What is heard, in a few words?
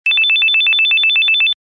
Technology Electronic Cell Phone Ring Tone